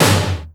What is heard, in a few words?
TOM, Drum Dry; East Collexion; Drum 1